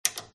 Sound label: switch on tv; fox audio